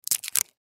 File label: Sticker Remove, Sticker Remove Peel Price Tag; Ripping; Objects; Blastwave; Foley